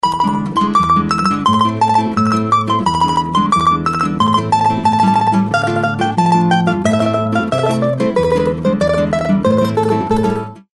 korobeiniki korobushka; Gypsies - Russian - Tetris

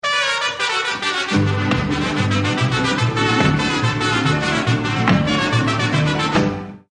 mexican hat dance jarabe tapatio; Mexican - Mariachi

